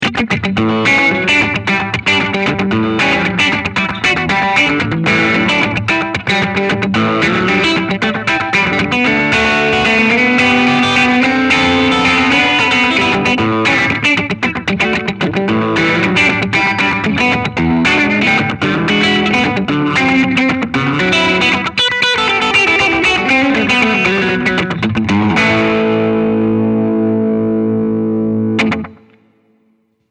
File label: 50s Twäng